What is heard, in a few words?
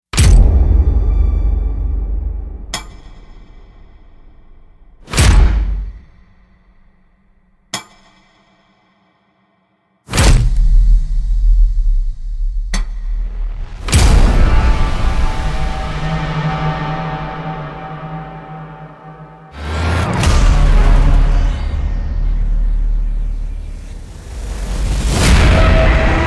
Impact Essentials; Field and Foley